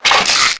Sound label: Pickup Painkiller; level items; Max Payne